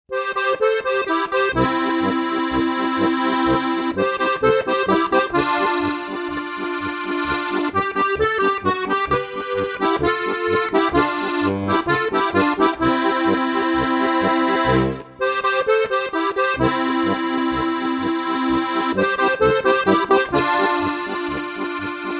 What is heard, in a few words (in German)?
Polka